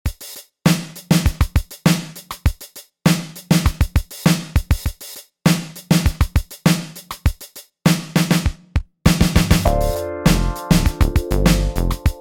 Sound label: put your hands up 80s electro